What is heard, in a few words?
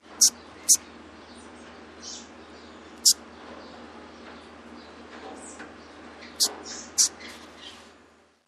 Virginia Opossum baby